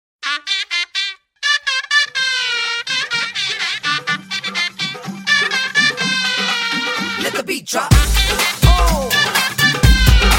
Beautiful Tone Pe Pe

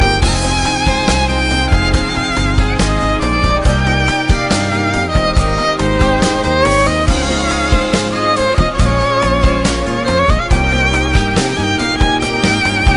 Romantic Violin

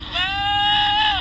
Goat screaming

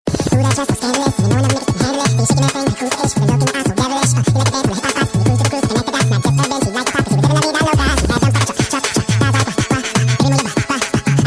Sms Chipmunk

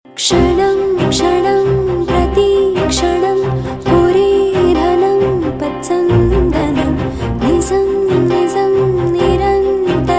Female

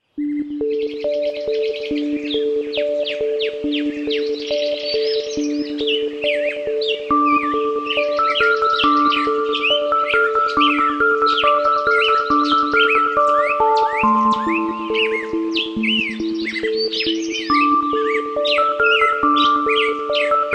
Bird Sound Mix

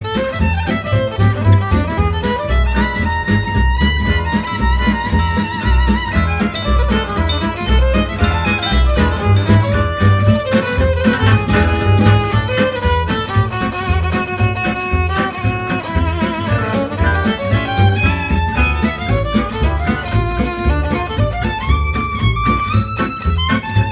gypsy jazz

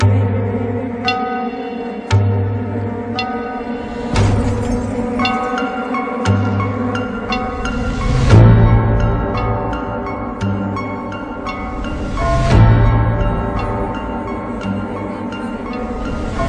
Trailer Music

